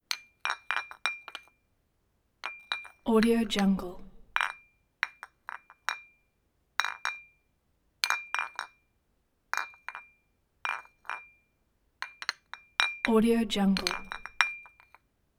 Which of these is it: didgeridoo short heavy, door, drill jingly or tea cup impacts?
tea cup impacts